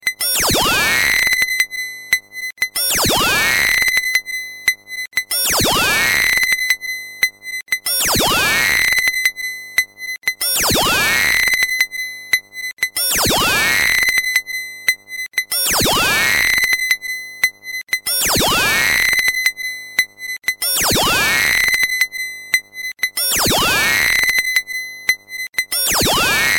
electronic tone

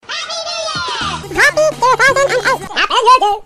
funny new year sms

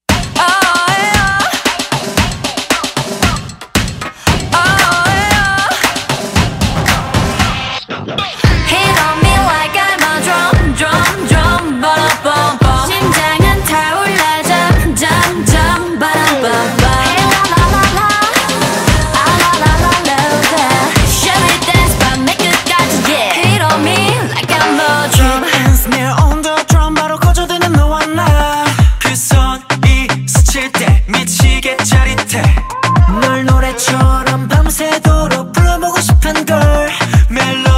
DRUM

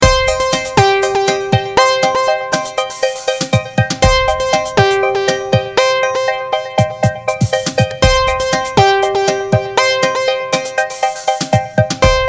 electronic bells

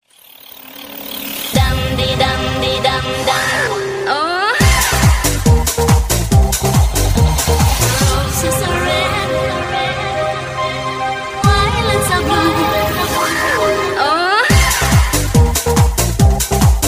dance baby dance

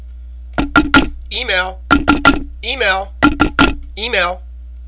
email knocking

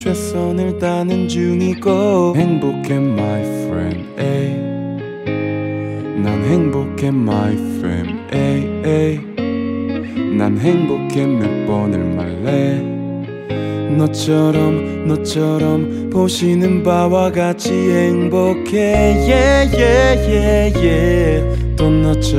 Loop

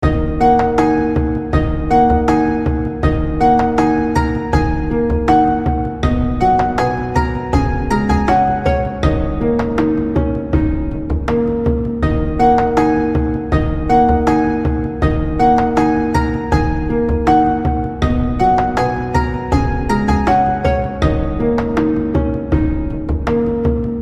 japanese zen

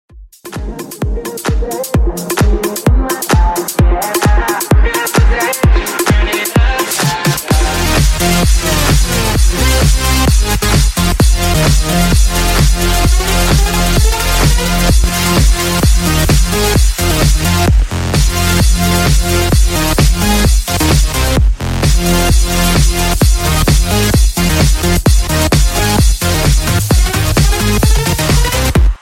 apple itune remix

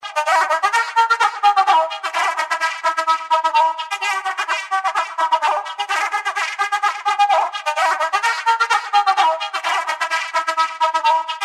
electronic flute

